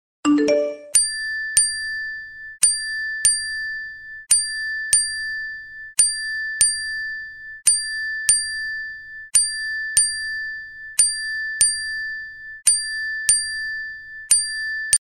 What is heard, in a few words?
notify ding